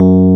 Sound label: RHODES P F#1; AKAI S-Series CD-ROM Sound Library VOL-2